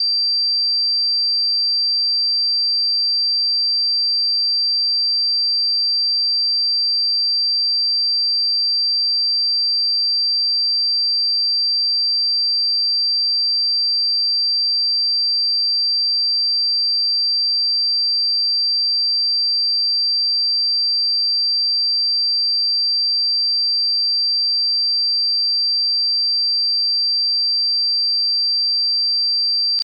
Ear Ringing

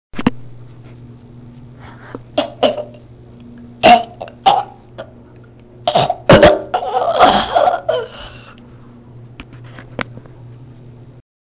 Choked

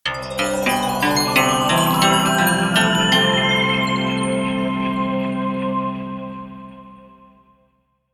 Oriental Magic Transformation

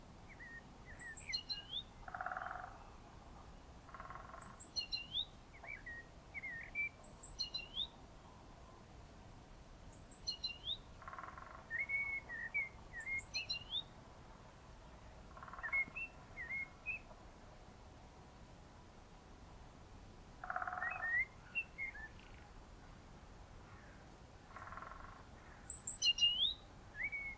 Woodpecker And Other Birds On A Spring Morning

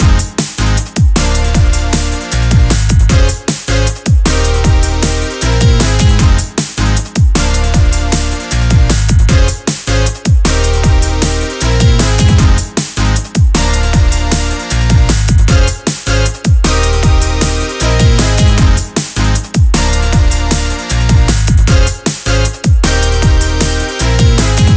Pop Loop

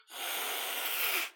Cat Hiss

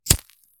Lighter

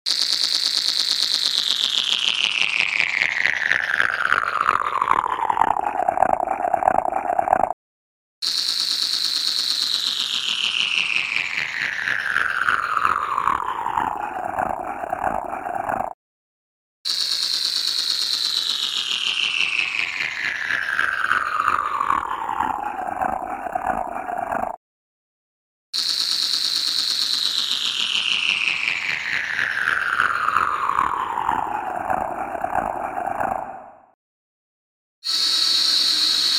Spin Down

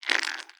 Rubber Squeeze

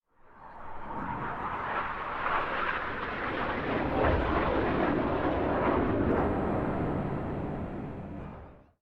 Flyby

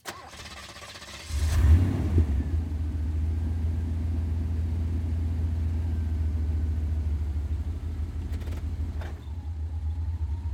1982 Z28 Away Fast

